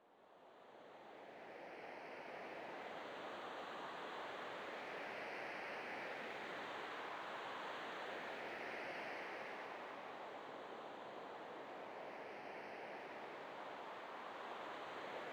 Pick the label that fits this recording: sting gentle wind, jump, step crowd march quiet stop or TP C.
sting gentle wind